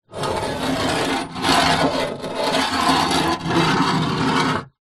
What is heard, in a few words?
foley metal rod drag scrape on rusty metal table; zapsplat